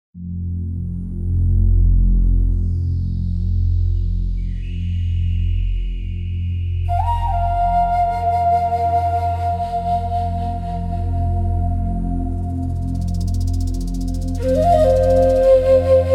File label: cinematic ambient journey soundtrack; tropical dawnrise; jungle-ambience